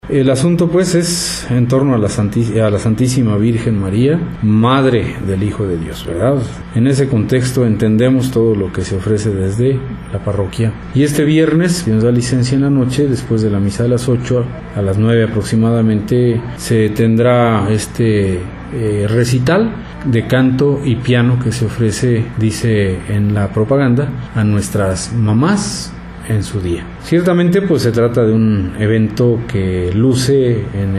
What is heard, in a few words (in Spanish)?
Recital de piano y canto; DIA DE LA MADRE; radiopia